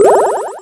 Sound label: Sound Wand Magic; arcade-canvas; ninja-runner